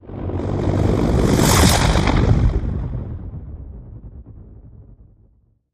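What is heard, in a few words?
Hit Swoosh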